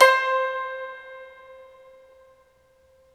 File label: SU GZHENG, Earth Tones